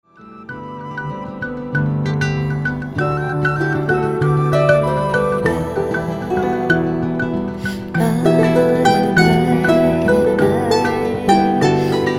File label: Raindrops